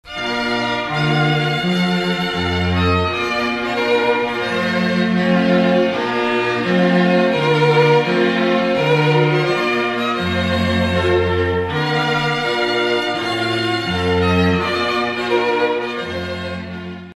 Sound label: trumpet tune